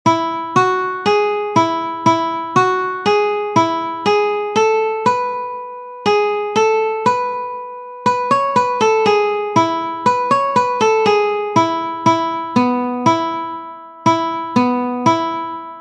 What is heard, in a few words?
Brother John Guitar One String